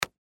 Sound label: baseball glove catch